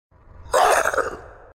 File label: zombie attack sfx; zombie pack sfx